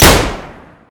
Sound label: ranged sniper; ctf